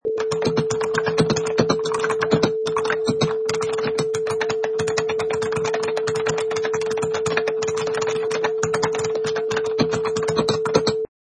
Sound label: Typing on Computer Keyboard